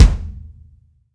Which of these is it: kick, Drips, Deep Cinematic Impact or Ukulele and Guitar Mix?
kick